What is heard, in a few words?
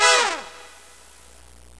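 TRUMPET FALL; MUSIC; INSTRMNT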